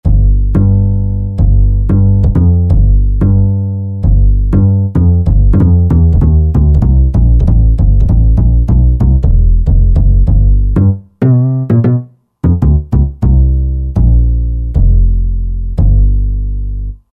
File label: Woody Bass, Roland JD-990; Factory Patches